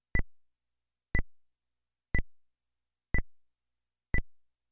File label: ticking